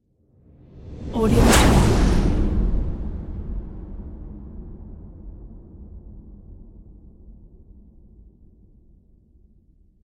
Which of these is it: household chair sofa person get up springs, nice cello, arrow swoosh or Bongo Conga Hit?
arrow swoosh